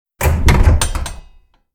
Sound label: Active Panel Button Up